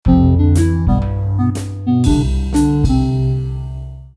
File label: jingle